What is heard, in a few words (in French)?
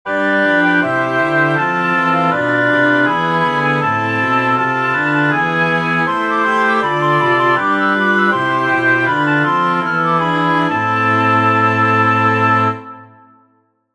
Alléluia, SATB et contrechant flûte; Messe de ND de lUnité; Pour l'Unité 2021